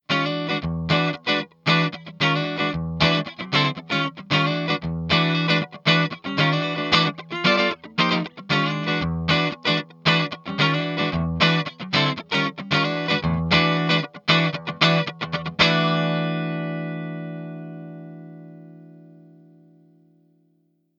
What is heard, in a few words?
greenback clean